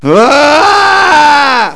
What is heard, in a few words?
FAARGH; Games.Carmageddon